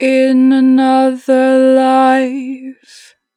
In another life, 2 bar vib, Vocals, Natural Vibrato; Harmor Vocals